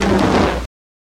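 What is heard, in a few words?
Torch Whoosh Doppler; torches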